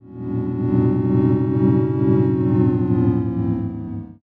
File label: chatter